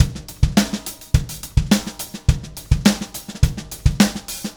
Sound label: Beat, Indie Rock; Sonic Foundry; Indie Rock Anthems; Clinical